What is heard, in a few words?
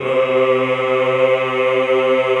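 Choir C2, Ehh; Eeh + Ehh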